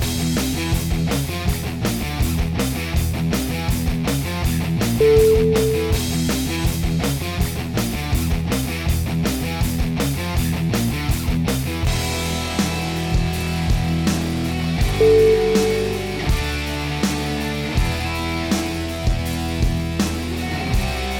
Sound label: metal force